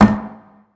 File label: Rim Hit